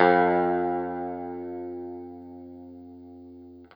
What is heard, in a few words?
Guitar & Bass; 90 s Sample CDs; Quantum Leap - Guitar & Bass